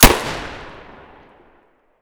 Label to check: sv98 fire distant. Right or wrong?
Right